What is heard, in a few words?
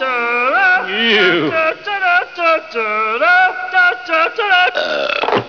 singing